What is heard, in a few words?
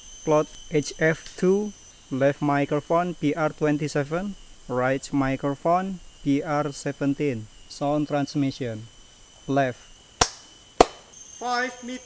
birds